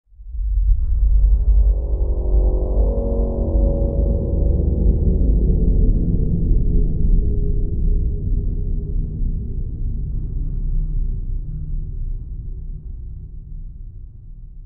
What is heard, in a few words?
Music Eerie Low Dist, pads